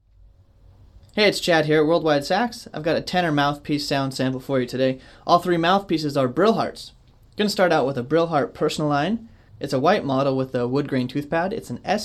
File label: tenor sax; PersonalineS5; Tonalin8